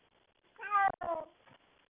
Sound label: cat meow